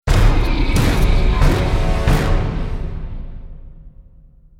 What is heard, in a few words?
Stinger Action Medium